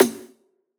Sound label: soft hitclap; osu; Taiko